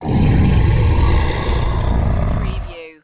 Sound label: Monster Growl